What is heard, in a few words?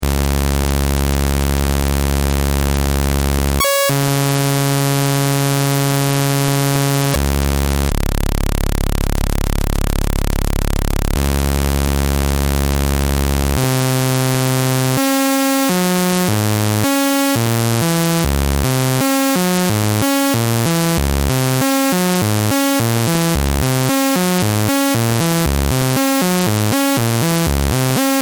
a111 saw; ノコギリ波